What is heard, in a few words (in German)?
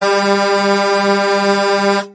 LKW Horn